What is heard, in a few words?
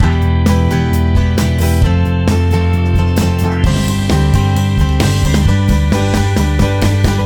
6th sus4